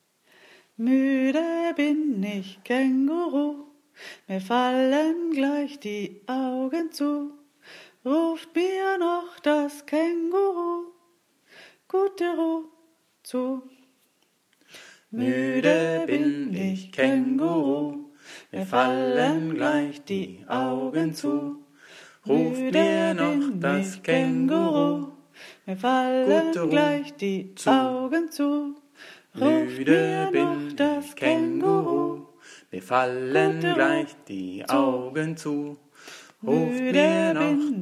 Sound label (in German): kind kaenguruh schlaflied